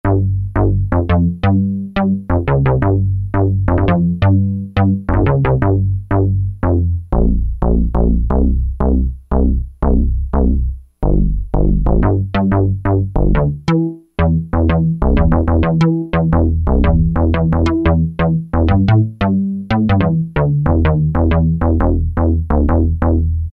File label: MiniMoog Bass; E-mu Proteus-1; Presets